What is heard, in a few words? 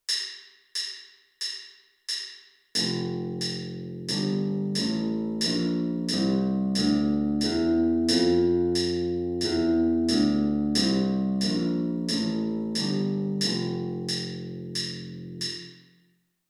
f major scale electric bass